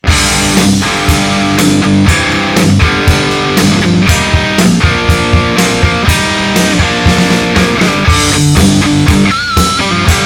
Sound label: Plexi; KPA